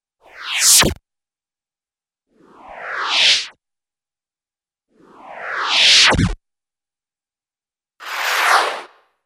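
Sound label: Swish In & Stop with record-like Scratch, Cartoon; Hollywood Edge; Cartoon Trax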